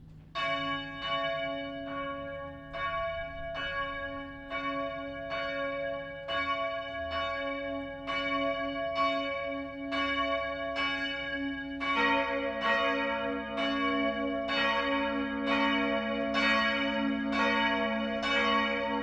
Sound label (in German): Glockenquartett, Kirche; Gottenheim